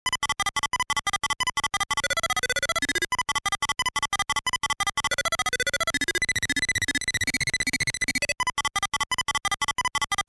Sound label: Battle; Giant Character Battle; Xanadu